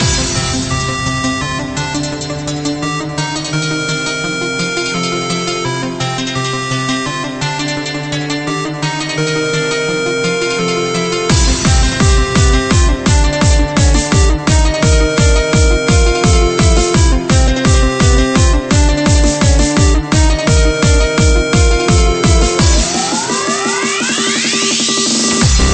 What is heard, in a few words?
Show Me A Sine